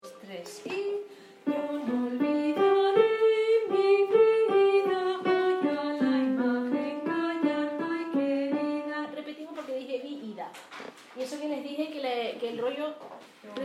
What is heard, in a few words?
La maja de Goya Alto